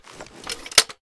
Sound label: ar gl reload fast shell load; weapons; gmod; viper; scharlie